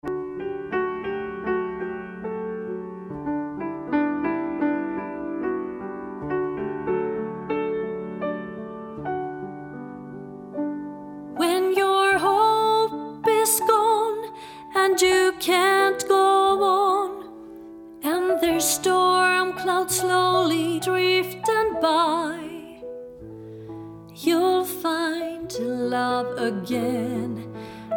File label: Rainbow with Piano intro